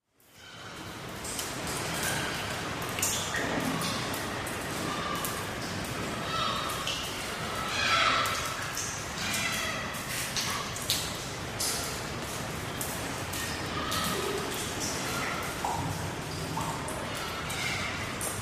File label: NOISE